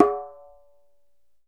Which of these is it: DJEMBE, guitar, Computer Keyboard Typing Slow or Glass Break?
DJEMBE